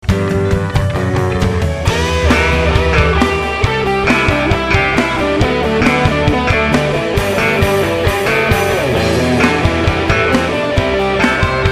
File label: Solo